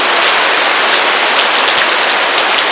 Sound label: rain